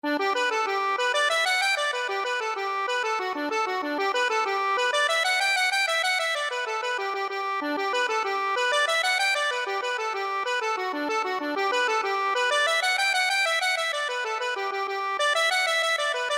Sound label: draught of ale, accordion